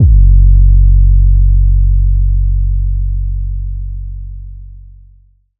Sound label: 808 F#1; Trap and Dubstep